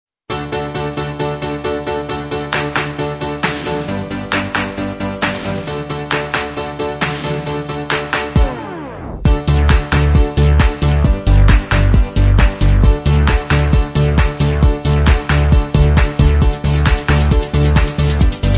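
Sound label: tv theme instrumental track; jessie tv theme; the disney channel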